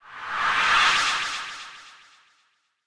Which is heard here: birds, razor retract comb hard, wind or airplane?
wind